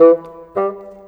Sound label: Bassoon, Cinematic; Sonic Foundry (Sony Creative Software); Orchestral Series VOL-3